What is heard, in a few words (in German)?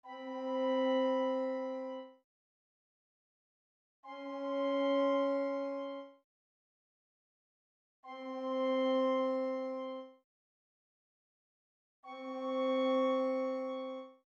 Octaven